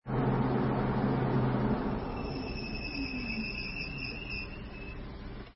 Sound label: front condensor fan screetching